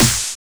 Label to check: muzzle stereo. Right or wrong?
Wrong